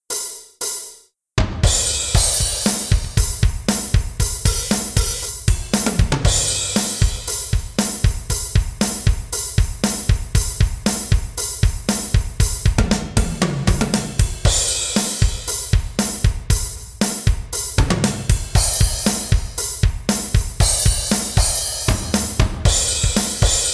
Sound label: drums